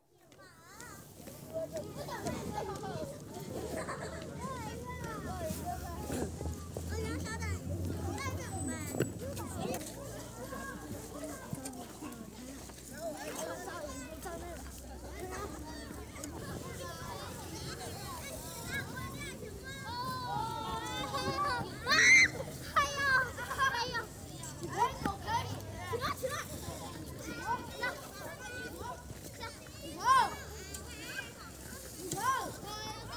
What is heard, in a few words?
Children Playing In Snow, Fushan; China; BBC Sound Effects Library